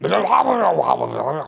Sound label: Voices scream; crazydave; Plants vs. Zombies